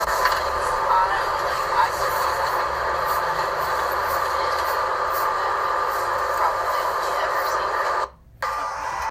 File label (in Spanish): flaps y slats